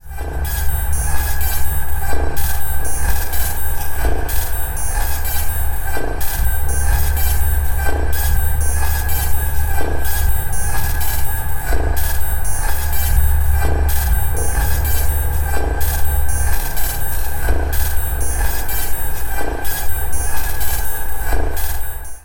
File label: non sine Hallo Wien; VCV